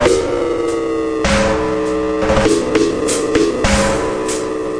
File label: barbituate beat, drum loops and breaks, 050 bpm; 000 to 080 bpm